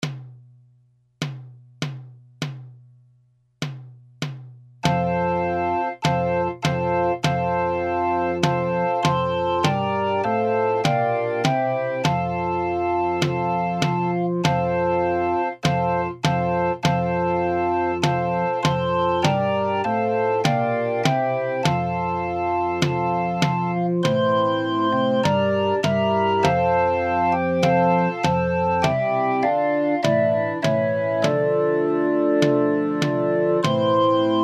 Pavane, 100

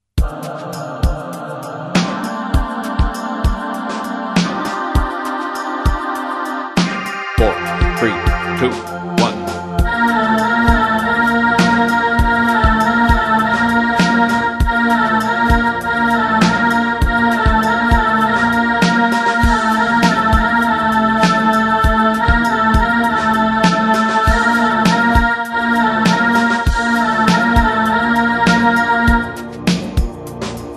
medium fast

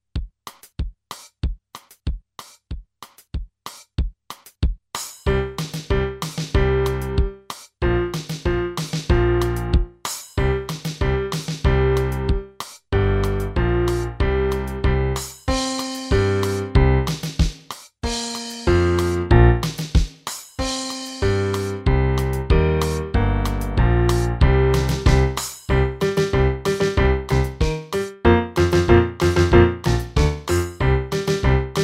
Drunken Sailor, 94

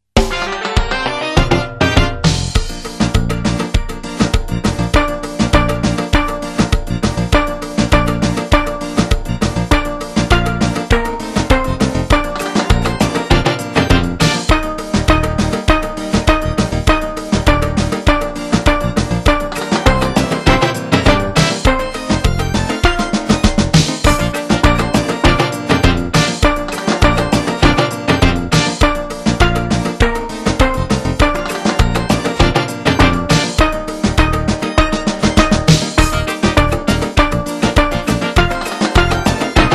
Jingle Bells faster

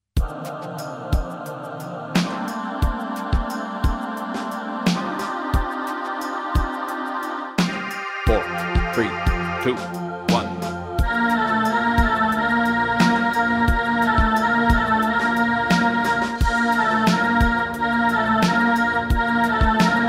Busy fSharps @ 88

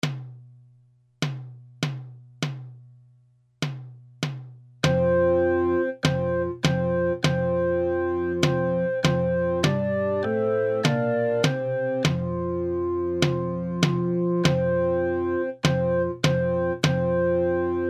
Pavane, 100

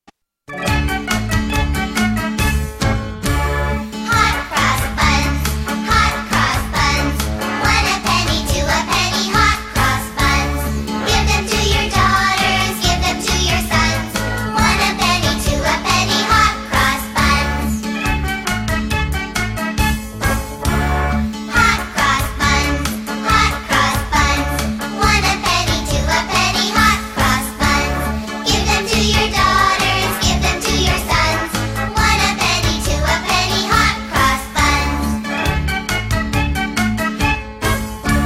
Hot Cross B sung version

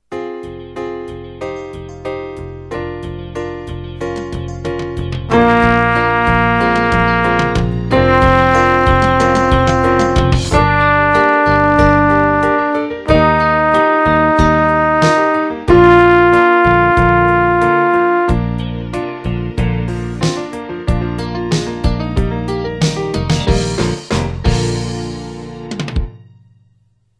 Scale Up

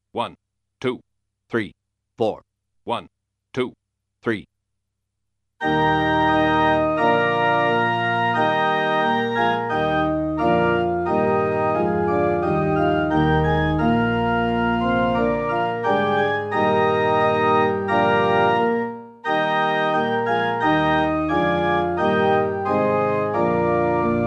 Trumpet Voluntary

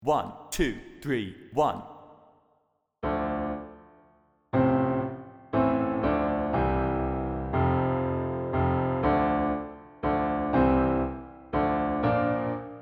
Basse Dance @120

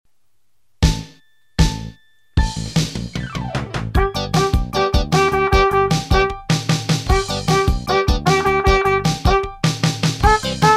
Rock and Roll

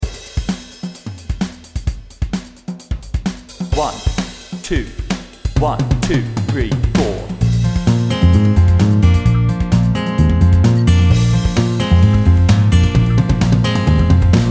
Speed Slurs Bb, 130

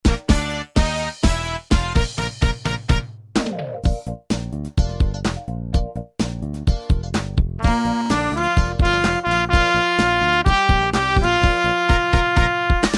Kum Ba JAZZ

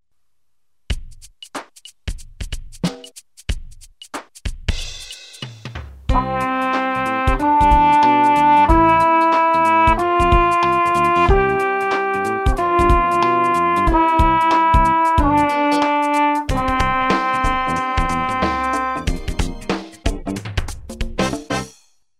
Five Note Scales D

